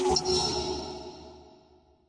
virus glitch reverb, Electro Hits

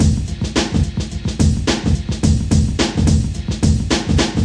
gate that dirt groove, 107 bpm, drum loop; 101 to 110 bpm

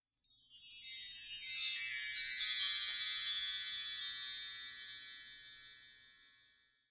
bell tree tinkles; Western and Latin Percussion; single hits

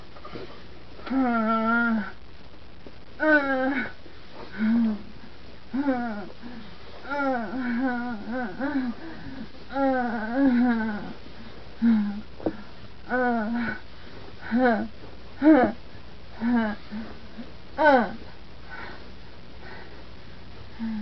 constipated orgasm

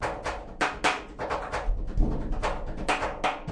135 drum loops and breaks; 131 to 140 bpm; samples-ghost

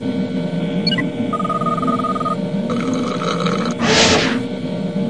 space keypad; Weird and Interesting Hits; single hits

